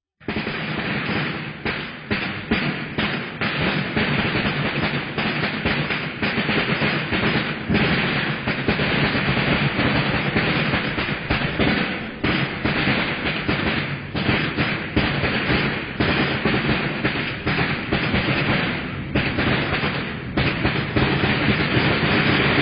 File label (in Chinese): 鳴炮